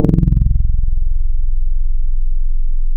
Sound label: real electric guitar; wavetables; Instruments; Synthetic's Serum Collection; ESW Core Wavetables; Echo Sound Works Core Tables